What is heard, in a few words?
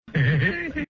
hehuhehe